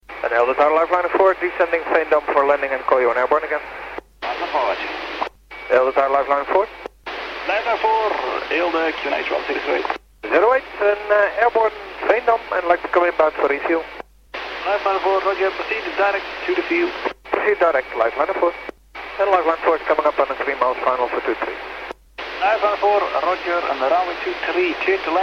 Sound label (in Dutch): lifeliner naar veendam en weer terug